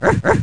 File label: BRK BRK